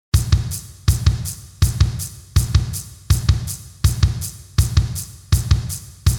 Charley et BD, Charley et Bass drum; elle marque les 4 contre-temps de la mesure; Ici, elle marque les 4 contre-temps de la mesure, pas très académique cette affaire, mais ça groove !

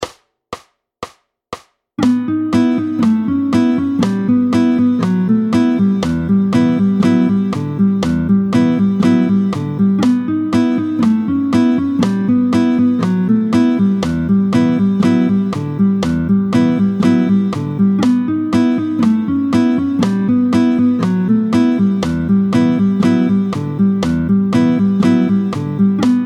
Corde glissante. Vite, tempo 120; Téléchargement Corde glissante tempo 120

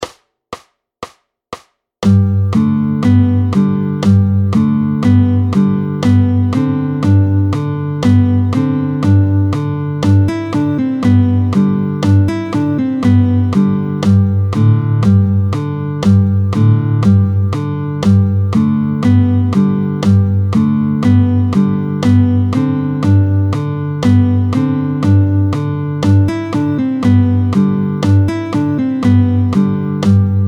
Frere Jacques Vite 120, picking

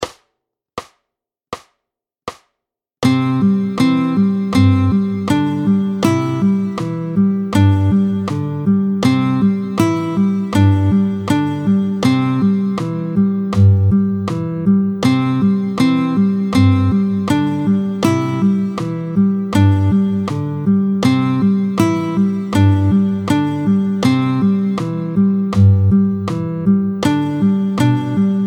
Au clair de la lune 80, picking

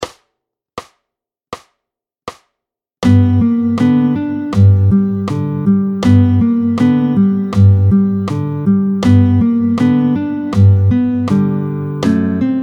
Jolie bouteille 80, picking

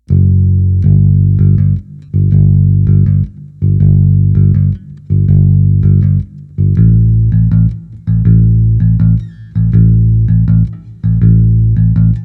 Basse precision, Basse Précision isolée; Basse Fender Précision; Elle fait son entrée au deuxième couplet (en mesure 64).

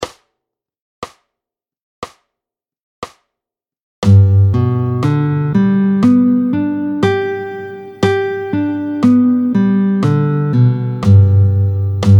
Arpege de Sol majeur tempo 60, arpèges au médiator; Michel Moulinié

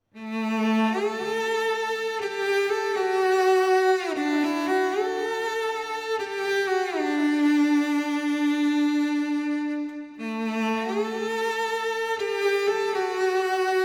Violoncelle

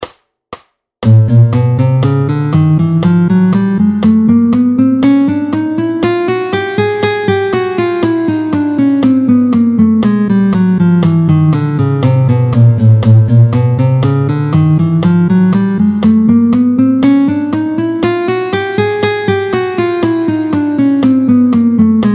Vite tempo 120, arpeges

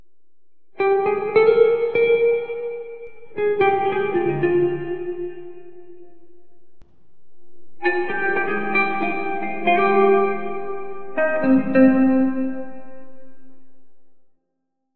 guitare intro, La guitare de l'intro

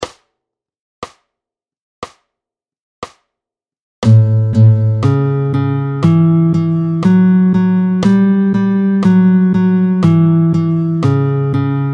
Guitare boogie tempo 60; le picking les arpeges aux doigts les arpeges au mediator

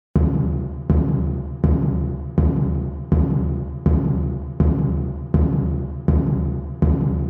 Surdo, Surdo isolé

